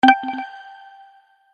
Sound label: Menu Ding